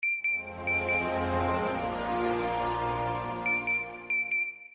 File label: Beeps